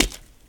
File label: Foot Step; Legend of Keepers (gamerip) (2019); Foot Step Gob